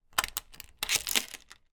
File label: door security chain unlock